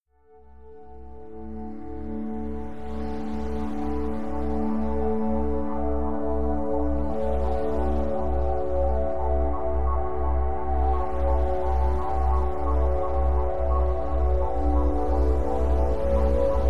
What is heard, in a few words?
639hz; Relationship Harmony